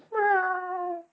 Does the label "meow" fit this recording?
Yes